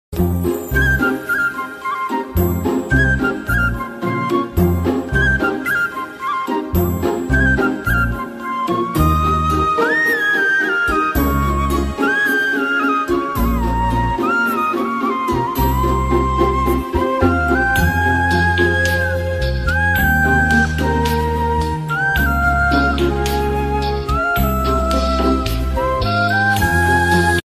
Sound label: bgm love bgm; deiva thirumagal; g v prakash